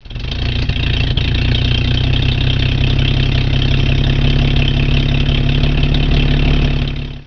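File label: rover 16 6 cylinder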